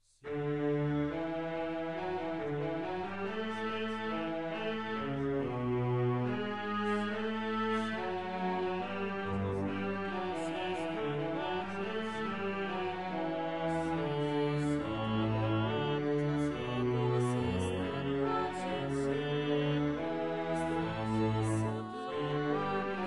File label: Sicut locutus est Soprano